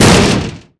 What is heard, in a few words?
Medium Impact; Totaled; XBOX